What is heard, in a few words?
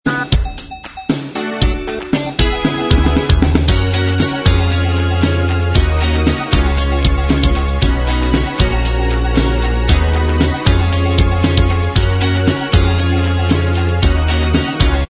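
instrumental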